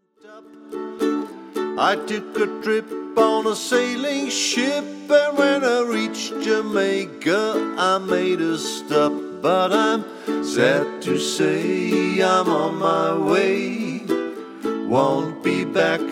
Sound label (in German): ukulele gesang